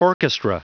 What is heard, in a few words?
orchestra